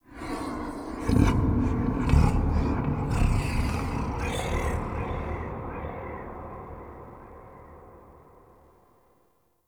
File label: Sky Breaths; Ambience; Duviri; Warframe